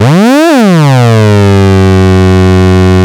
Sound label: MEMORYMOOG, F#2; Big Fish Audio - First Call Vintage Keyboards